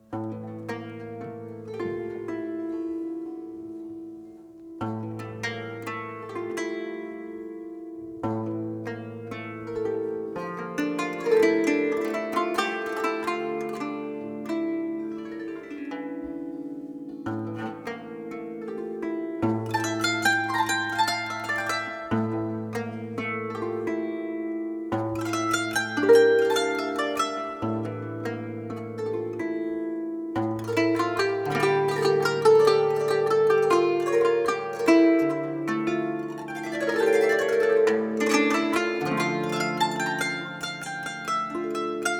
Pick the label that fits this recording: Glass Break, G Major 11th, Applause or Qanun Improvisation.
Qanun Improvisation